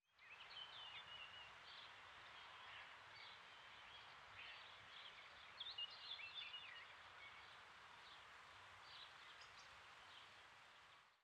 Arbre Lierre Oiseau Pépillement, Friche Industrielle Rhinau

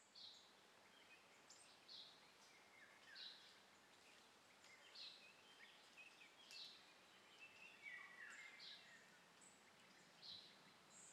Très Ancienne Carrière Oiseaux Brindilles Moustique

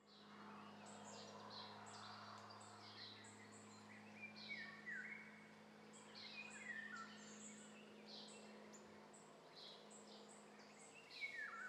Avion Oiseaux Et Herbes, Très Ancienne Carrière

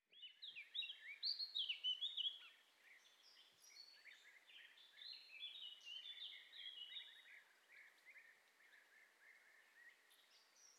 Friche Bord Forêt Oiseaux Et Grenouilles, Très Ancienne Carrière Lac

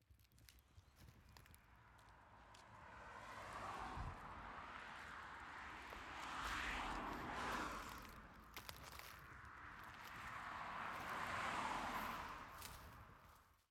Friche Scierie Bord Route Marche Brindilles; Châtenois

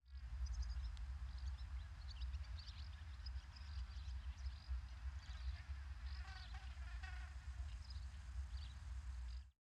Basse Voiture Et Pépillement Et Abeille, Friche Industrielle Rhinau

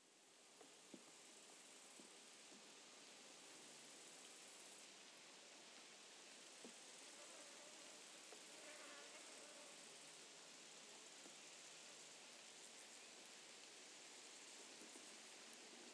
Bord De Route Allemagne Vent Brindilles Tape Micro Sauterelles Passage Voiture

